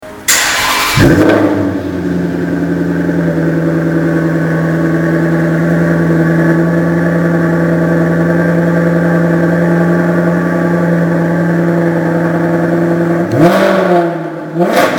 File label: Maserati GranCabrio Sport